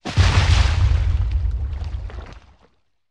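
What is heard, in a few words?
Small Underwater Explosion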